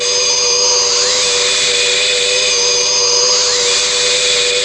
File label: UFO sound